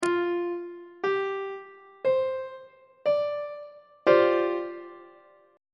f double sharp dominant 7th suspended 4th 3rd inversion on treble clef